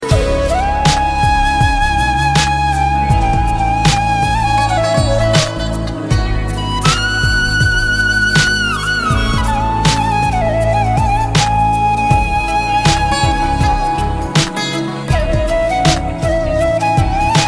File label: classic flute